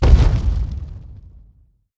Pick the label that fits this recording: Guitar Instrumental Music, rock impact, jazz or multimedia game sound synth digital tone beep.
rock impact